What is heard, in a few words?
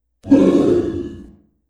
roar